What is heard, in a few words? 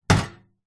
industrial metal toolbox with tools inside set down concrete